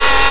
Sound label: zoom in; Nintendo; July 2020 Gigaleak